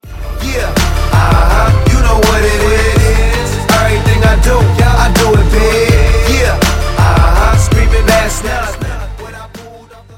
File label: win, music